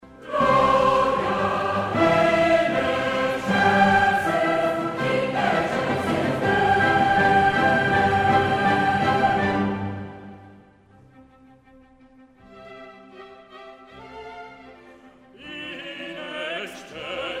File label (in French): concert choeur; activites culturelles et sportives; saison hep vaud